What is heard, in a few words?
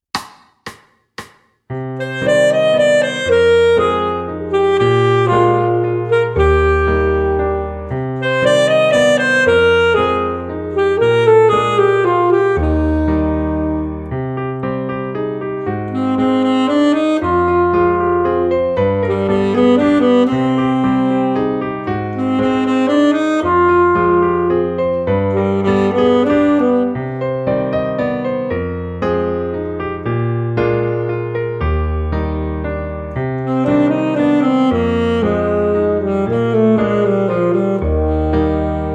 multi sax; multicolores